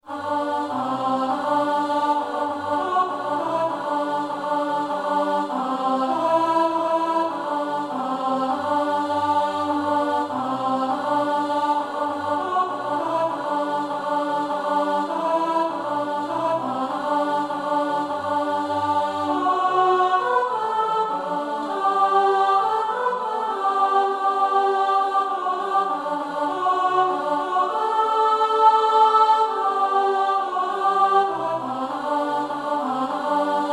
Alto

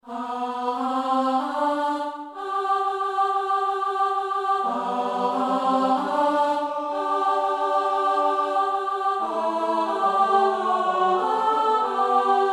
Alto

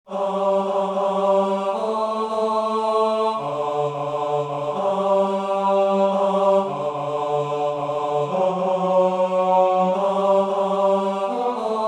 Bass

